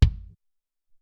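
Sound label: Solid Kick, drums; Alesis SR-18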